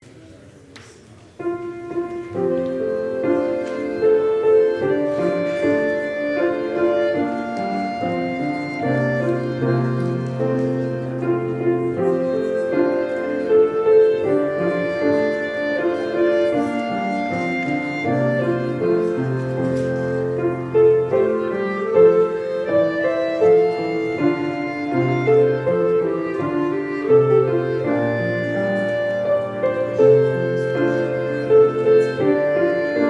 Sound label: clarinet